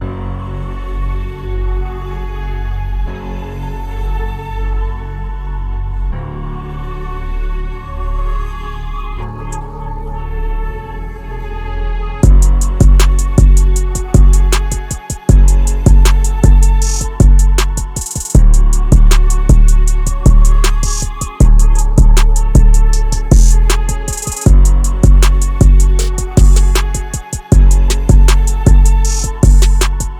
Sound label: Inst